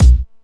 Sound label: clear kick drum single shot B key, Royality free bass drum one shot tuned to the B note; kick drum samples